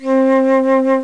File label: Flute "w_vib" C4; Indiana Jones; iMuse